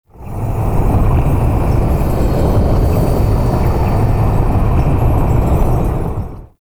Interior Orbital Station Wind; Warframe; Tau

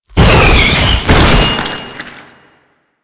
Kamikaze Flesh, Proto Infested Volatile Runner; Warframe

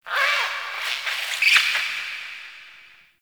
Critter Squeak Alt; Warframe; Ambience; Nokko Colony

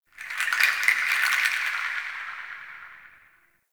Wood Chimes; Warframe; Nokko Colony; Ambience

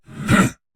Attack Effort

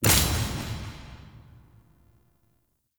Vendor Buff Menu Close; Tau; Circles Of Hell

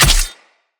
Long Sword Impact Flesh

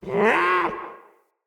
Forest Open Glade Animal Far; Day

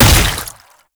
Underground Attack Tentacle Slam; Enemies; Abilities; Warframe; Proto Infested Babau

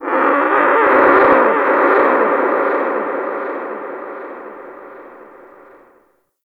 Outpost Creature; Corpus Outpost; Warframe

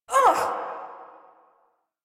Pain, Barks; Warframe; Corpus Lich; Persona D

